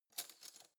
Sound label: cutlery spoon drawer place soft